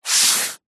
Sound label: office sponge brush single stroke on paper; zapsplat